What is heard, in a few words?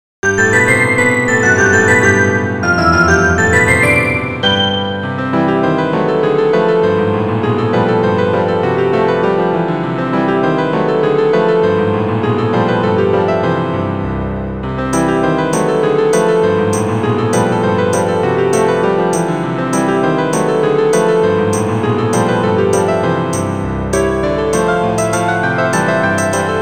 swing